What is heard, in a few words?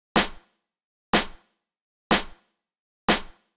Big EDM Clap Loop, 123 BPM